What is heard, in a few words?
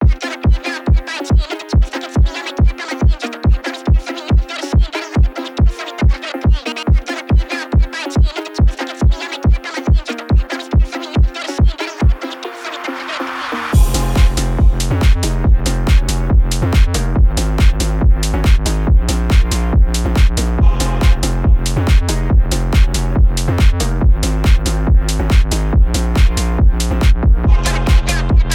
drift phonk style music